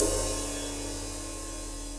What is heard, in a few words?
Ride Brush Club Sizzle; Drum Hits; Standard Hits; Ableton; Factory Packs; Session Drums Club